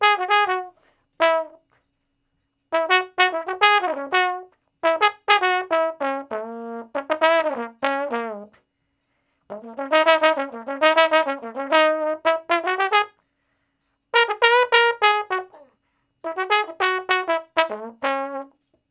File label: Trombone Straight (Db); Sonic Foundry (Sony Creative Software); Horncraft for R&B; Solos